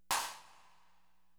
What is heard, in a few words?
SNR RVB, Claps